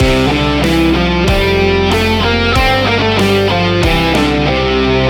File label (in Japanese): ミクソリディアン